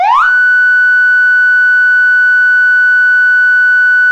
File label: manual, sirens; federal sig ms4000